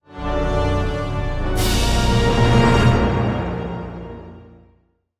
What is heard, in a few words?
Stingers MISSION START 175BPM